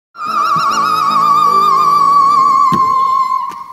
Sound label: Haunting